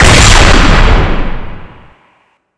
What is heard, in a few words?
halogun